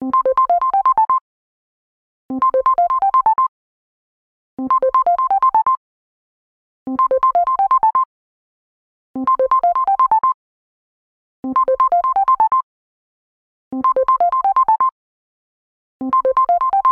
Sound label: Simple Bell